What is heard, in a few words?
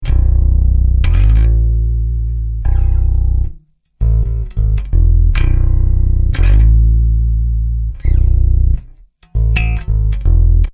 Hip Hop Bass Guitar Loops 90 Bpm B Slide